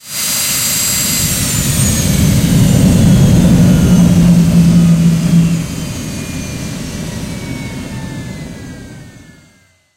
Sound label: turbine interior stop, tanks; War Thunder